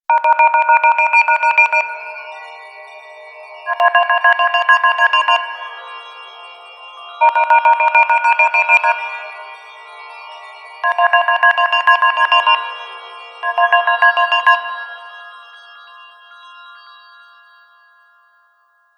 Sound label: Chrome Tone, ringtones; Motorola MOTOLUXE-XT615